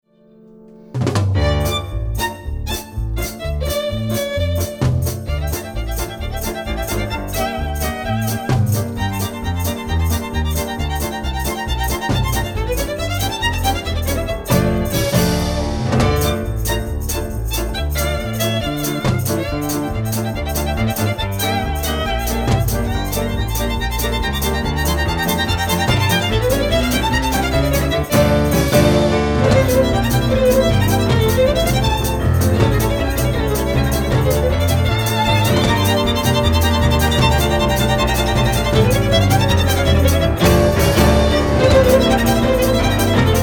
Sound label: czardas accelerando; vittorio monti